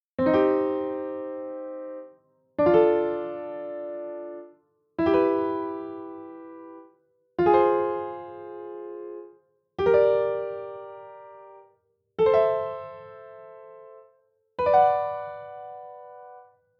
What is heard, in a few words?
chord name minor sound